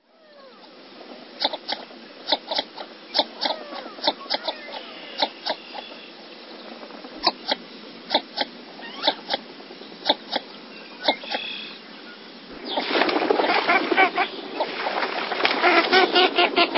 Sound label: Northern Shoveler; Birds